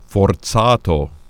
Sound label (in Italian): Forzato